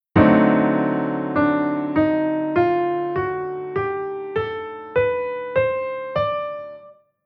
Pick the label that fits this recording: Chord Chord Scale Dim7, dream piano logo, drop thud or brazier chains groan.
Chord Chord Scale Dim7